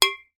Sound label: impact metal small pipe hit another; zapsplat